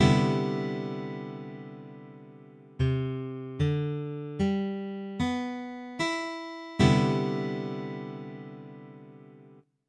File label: Cmaj9, Guitar Chords